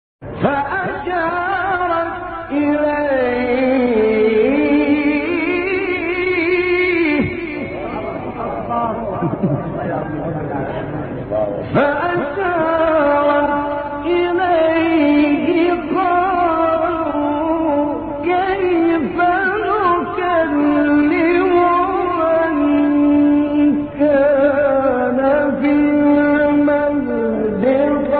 تلاوت زیبای آیه سوره; مریم; استاد غلوش

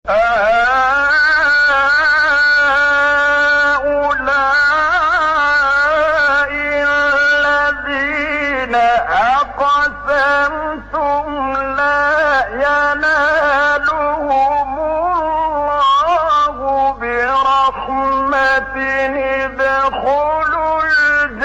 تلاوت سوره اعراف; استاد طبلاوی

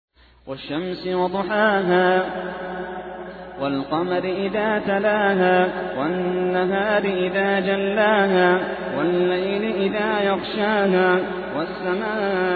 ترتیل; سوره شمس

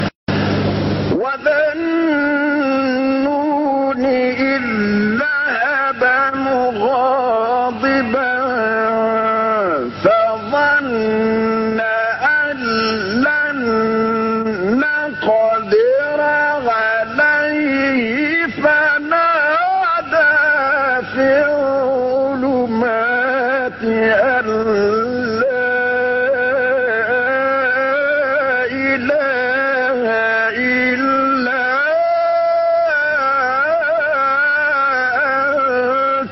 مقطعی ناب سوره مبارکه انبیا; استاد طبلاوی; 87

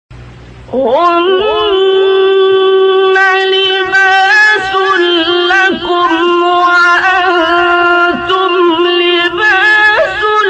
تلاوت زیبای بخشی از آیه سوره مبارکه بقره; 187; عبدالباسط

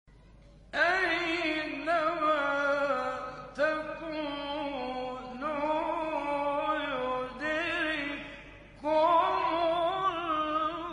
فرازی از آیه سوره نسا; آیه 78; استاد غلوش

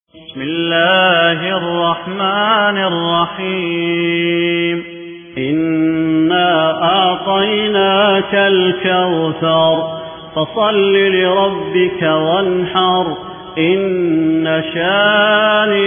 ترتیل روایت حفص عن عاصم; معیض الحارثی; سوره کوثر

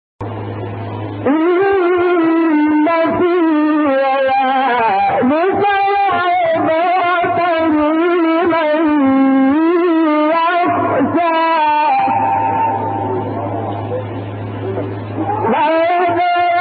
تلاوت روحبخش آیات سوره مبارکه نازعات; 26 27; شعبان عبدالعزیز صیاد

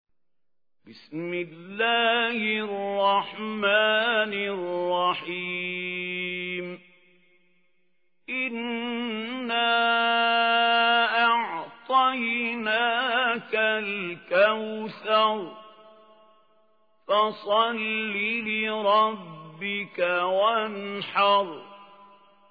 تحقیق سوره کوثر; استاد خلیل الحصری

